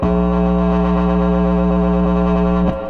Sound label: Industrial Wub